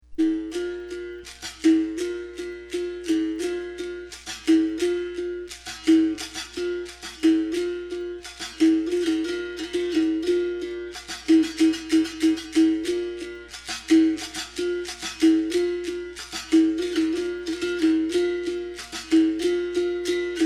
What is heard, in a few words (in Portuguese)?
toque banguela, capoeira; toques